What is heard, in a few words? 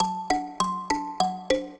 trio, mbira; Mozart